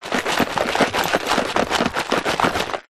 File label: industrial tool box small plastic shake